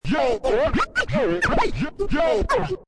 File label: scratch